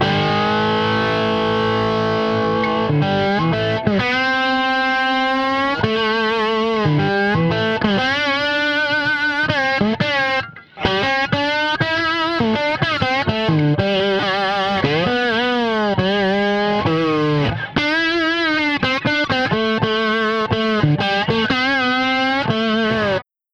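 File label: Blues Guitar Melody; A Sect Mel; Digidesign; Pro Tools Essential Loops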